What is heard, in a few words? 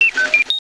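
computer; Spider-man Cartoon Maker; brushes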